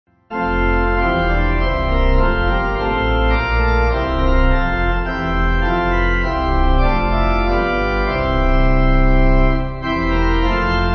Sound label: Pipe; What Joy; Hermann